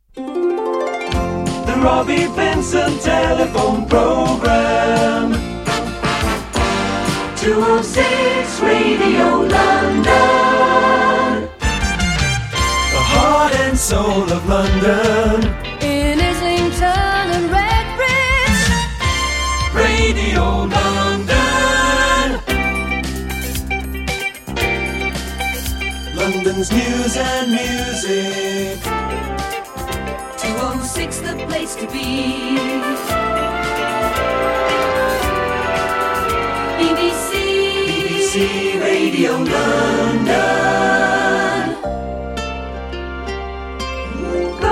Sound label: jingles; mid80s